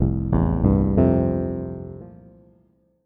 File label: Electro Chord